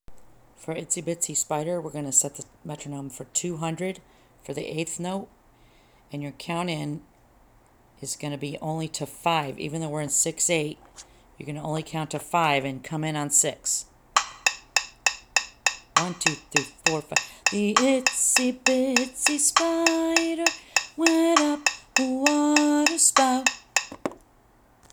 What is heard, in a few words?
Itsy Bitsy Count in